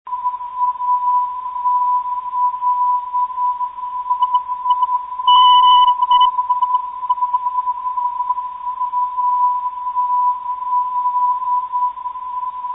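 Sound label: 422 kHz, aero non-directional beacon; grc aero ndb; atl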